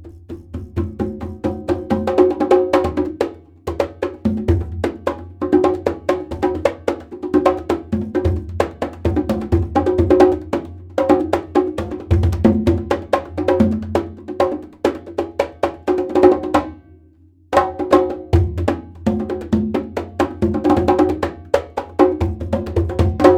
CONGAS Original Audio

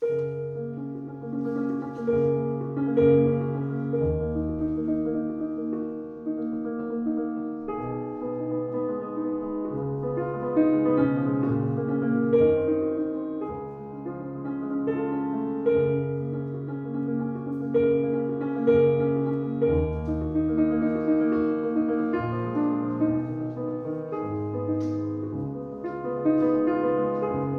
Impromptu Noise; ac3 96kbps; Schubert

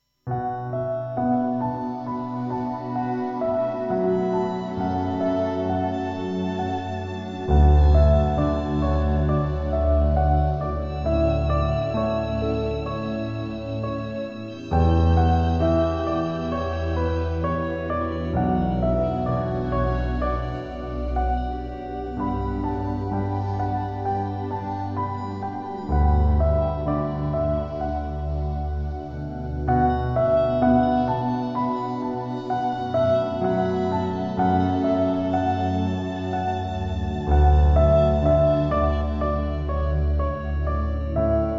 Soft Piano Music 32000hz mid-high; Mid-High Quality

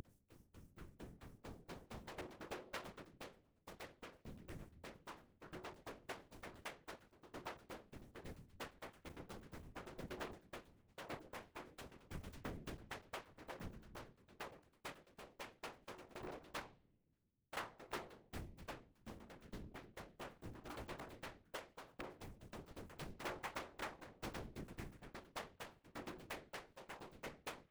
CONGAS Residual Noise; OGG HighMid

